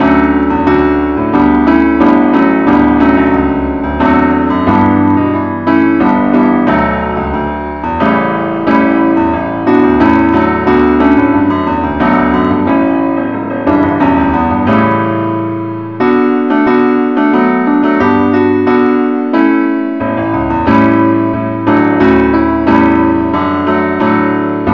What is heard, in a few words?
ineedthee keyboard only key d